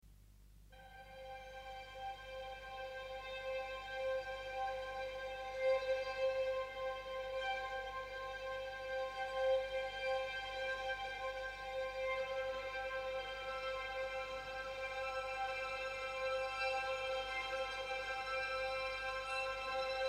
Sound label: PIANO